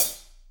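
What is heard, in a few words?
HH, hi-hat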